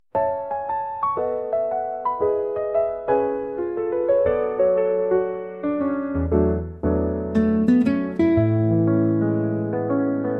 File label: music interface